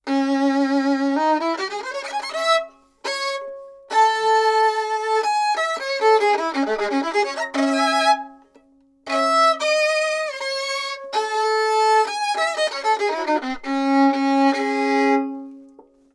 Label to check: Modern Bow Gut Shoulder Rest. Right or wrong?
Right